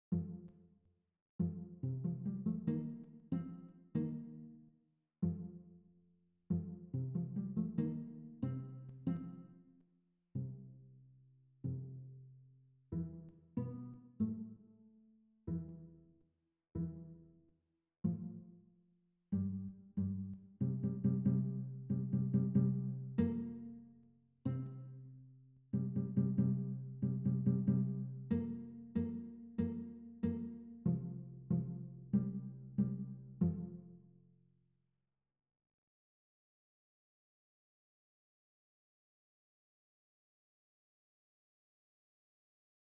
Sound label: a new day pizz flutes